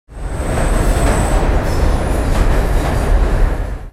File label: subway passby; Marc Ecko's Getting Up: Contents Under Pressure; XBOX